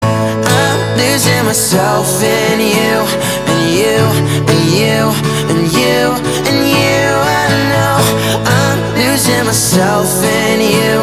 acoustic

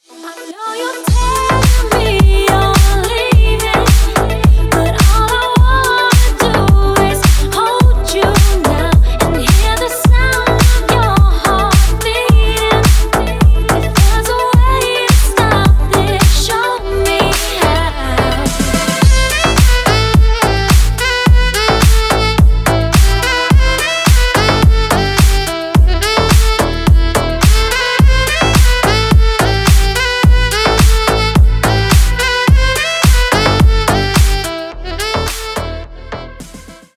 heart beating

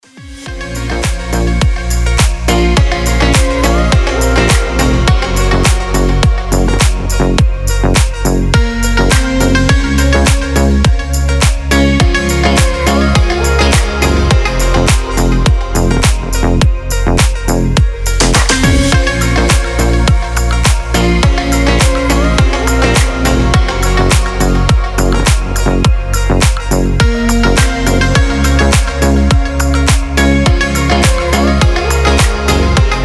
techno

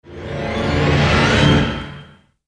horror sound fx

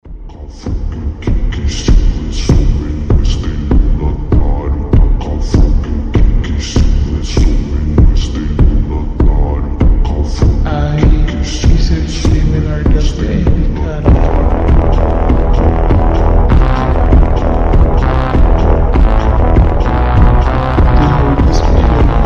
beat das trevas

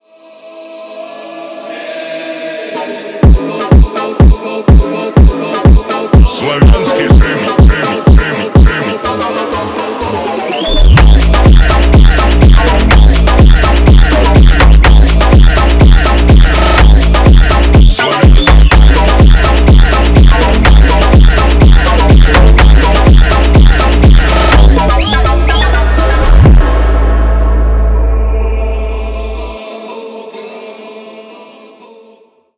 Slavic funk

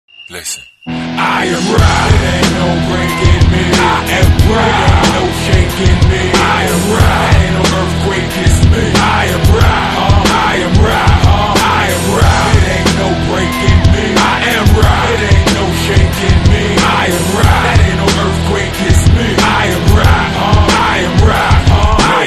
rock

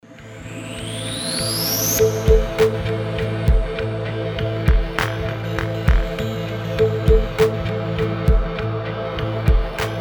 deep atmospheres

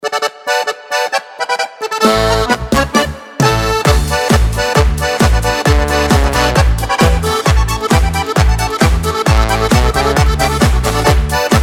polka

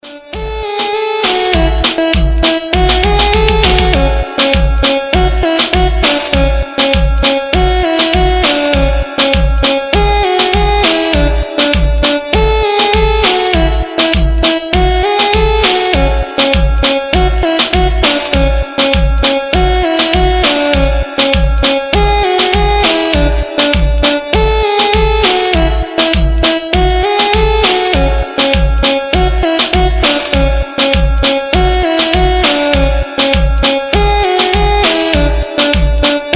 rumba

